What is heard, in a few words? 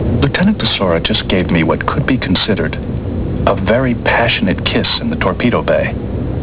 kiss